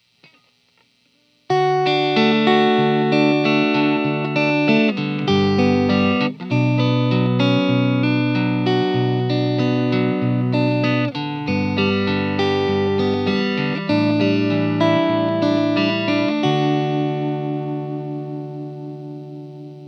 Next up is a simple chord progression done finger style. The CE-2 has both Rate and Depth set to noon, chorus, guitar